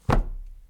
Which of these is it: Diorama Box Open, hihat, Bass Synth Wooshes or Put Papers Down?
Put Papers Down